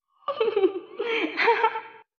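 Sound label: girls laugh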